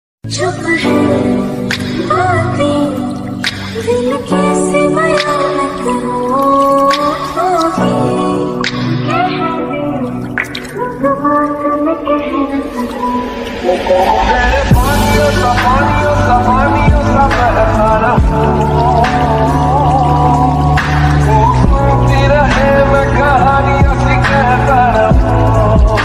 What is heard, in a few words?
hindi ringtones